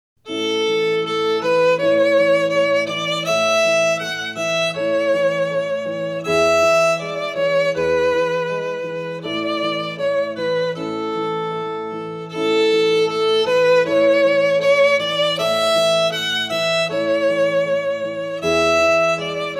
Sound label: Violin Long, Long Ago; Suzuki Violin Method; T.H. Bayly